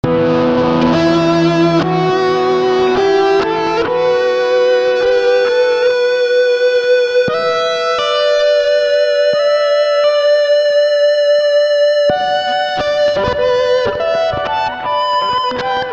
BOW

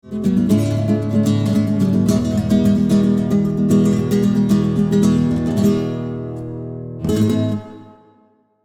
Nylon Gtr Dream Verb

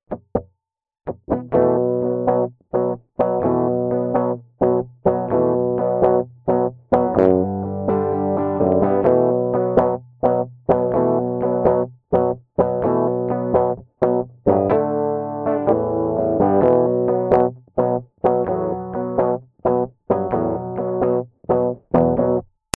Small combo lead

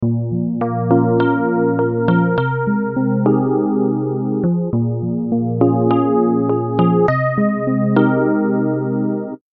Seventies EP

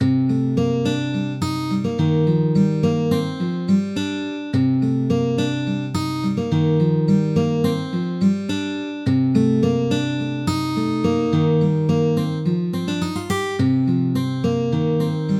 Martin Steel Picking

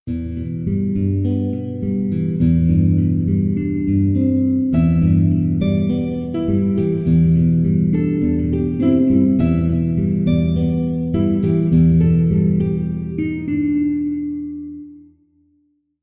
pink flamenco

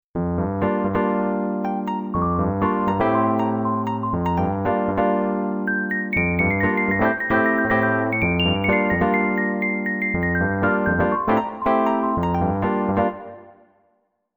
Rhodes Harp EP

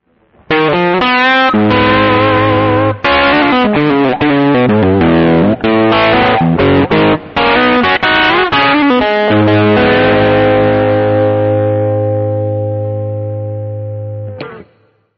blues solo

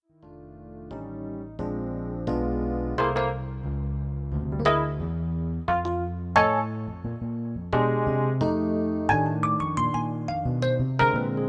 Electric Piano

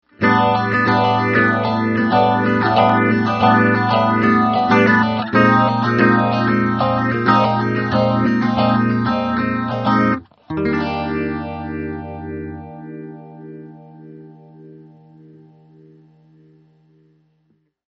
analog phaser

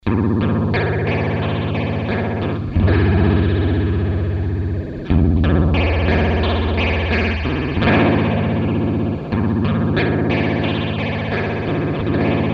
fast ring speed

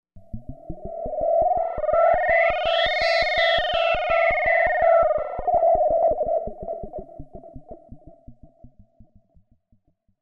cosmic chatter

